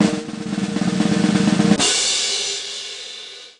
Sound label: Jingle Finish Fanfare; tamagotchi connection corner shop ds